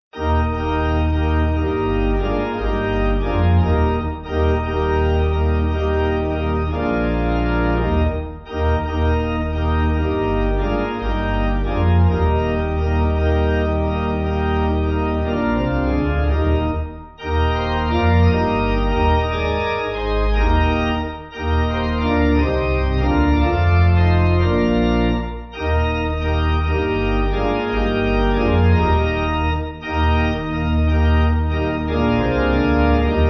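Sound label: Eb Pipe, Pipe Organ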